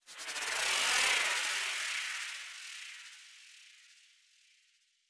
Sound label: Heavenly